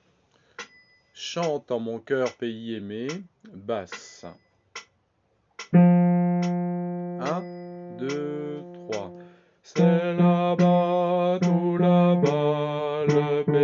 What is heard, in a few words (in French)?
chante en mon cœur basse